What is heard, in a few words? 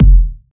kick sound F key